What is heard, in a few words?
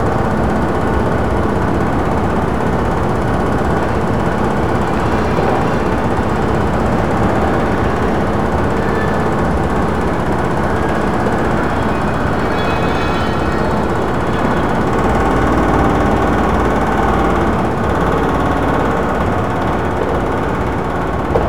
construction; ambience; Project Gotham Racing 2